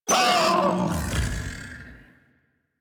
Kubrow Feral Vocal Death; Warframe

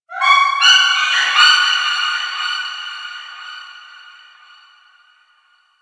Forest Distant Bird Of Prey; Ambience; Warframe; Grineer Forest

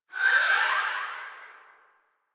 Gas City Distant Textures; Ambience; Warframe; Corpus Gas City

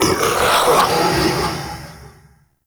Male Vocal Pre Death; Characters; Enemy; Vocals; Mockeries

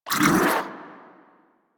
miasma cast; Warframe; Saryn

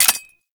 Fire Stop Mech; Weapons; Gotva Prime; Warframe

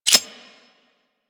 Heavy Shotgun Reload; Weapons; Warframe; Tenno

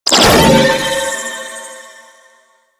Primary Proj Attach, Weapons; Warframe; Cephalon

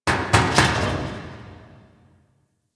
Close Metal Hits, Asteroid; Ambience; Warframe; Grineer Asteroid

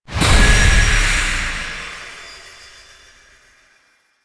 Horse Racing Portal Touch Final; Duviri

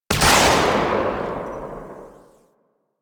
Railgun Tail; Weapons; Warframe; Archwing

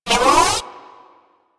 Door Open; Clan Dojo

